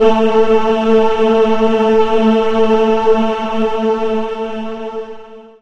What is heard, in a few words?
Alesis Andromeda A6 Mix Choir